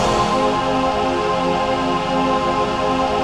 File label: VOICE PAD; Best Service; XX Large; Pads